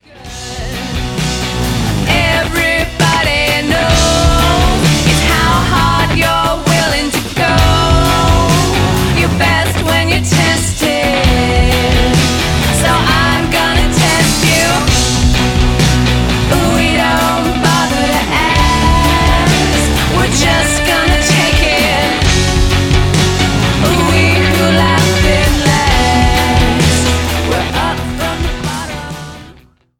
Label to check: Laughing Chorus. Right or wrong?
Right